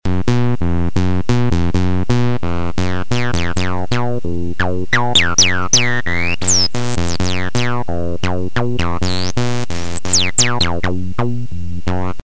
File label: saw